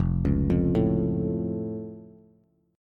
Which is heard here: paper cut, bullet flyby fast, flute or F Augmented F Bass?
F Augmented F Bass